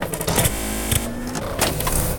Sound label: attack1, megasuit; general combat